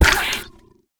land hit; toxifin

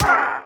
death, parrot; mob; minecraft

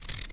peel, potato

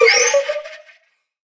allay item given; mob; minecraft

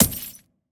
ominous bottle dispose; minecraft

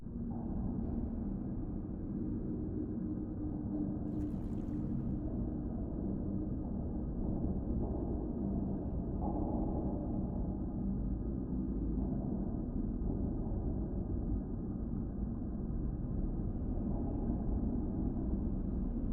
wasteland, ambient; potato

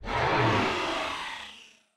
plague whale elder idle

